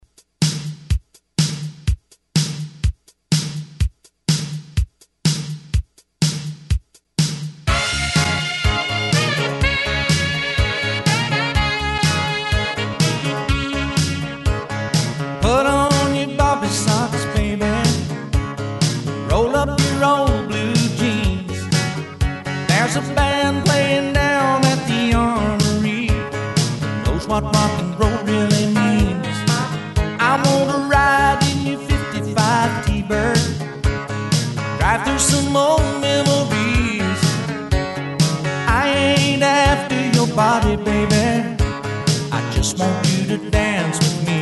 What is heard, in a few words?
80s Re Drum 124 Bpm; DJDOLLS